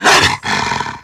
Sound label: roar; The Elder Scrolls III - Morrowind; wolf